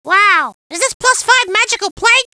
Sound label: Reactive Armor Pickup; Wow! Is this plus five magical plate?; Gruntz